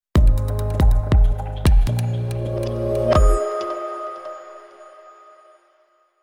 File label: Jumping on; Alcatel Shine-Lite; switch on off